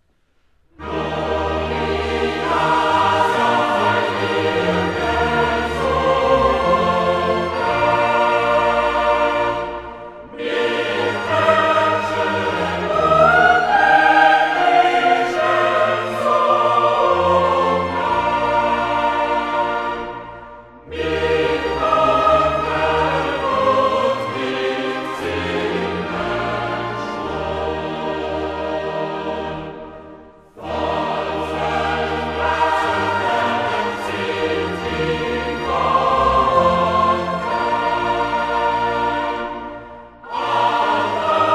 Chorale Gloria sei dir gesungen; Vinyl-I-Digitized

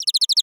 Animal Bird American Goldfinch Chirp; animals; AdobeCC

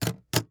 Household Briefcase Unlock

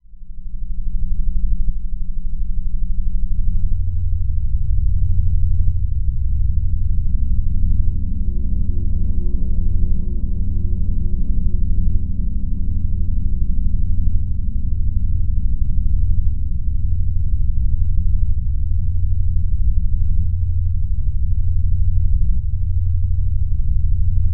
Drone Bed Pulsing; Production Element Imaging; AdobeCC

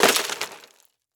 Crash Wood Debris Falling On Wood; Crashes

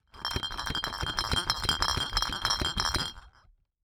Household Bottle Six Pack Rattle

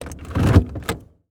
Auto Gear Shift Gear To Park; Transportation

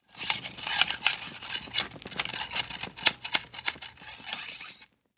Rifle Barrel Clean, weapons; AdobeCC

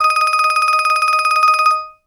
Technology Electronic Cell Phone Ring Tone

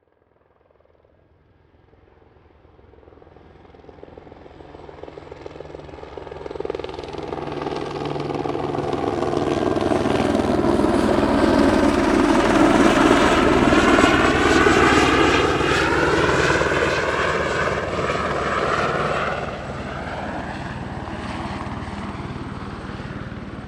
Plane Drive By With Helicopter; transportation

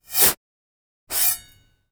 Industry Shovel In And Out Dirt

